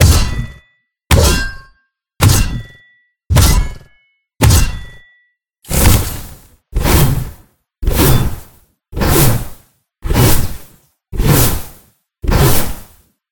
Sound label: Pickaxe; Rhino